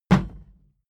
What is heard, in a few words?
plastic box big kick; maschinen; metall; soundpool